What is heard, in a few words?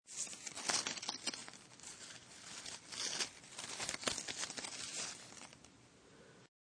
Leavs Crkle, Small batrch of leaves being crunched and crackled.; Crackling; Objects